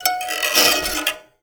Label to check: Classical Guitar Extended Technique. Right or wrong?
Right